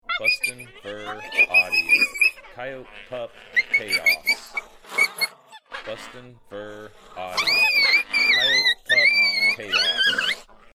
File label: Coyote Pup Chaos